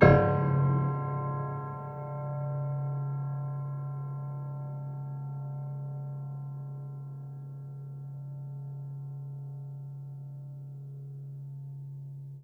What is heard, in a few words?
Harm Ped Low, Piano Harmonics